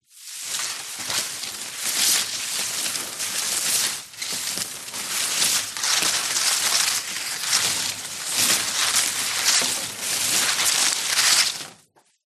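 tree leaves palm rub rustle against window in wind exterior; foley; zapsplat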